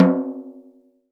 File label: Snare Drum; Percussion Samples